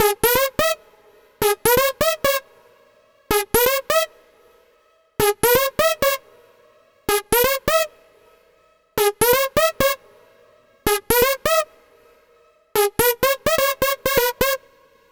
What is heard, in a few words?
Android Riff, Funk; Dr. Fink's Funk Factory